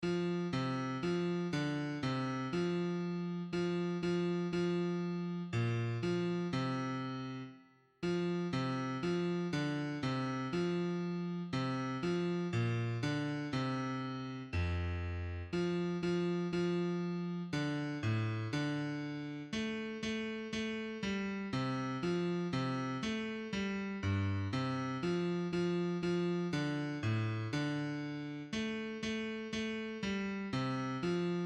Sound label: Ave Maria B mid; Arcadelt